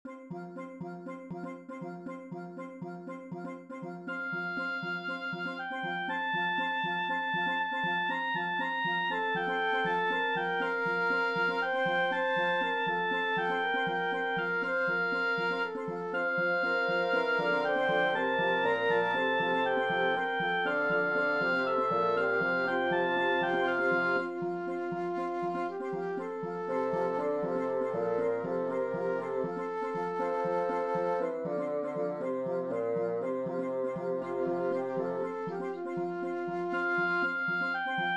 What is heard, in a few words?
Drummer